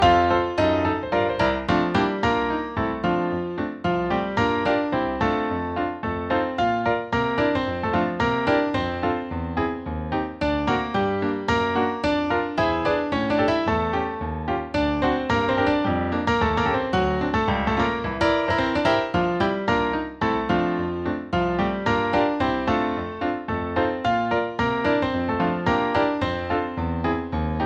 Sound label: accompaniment; guys and dolls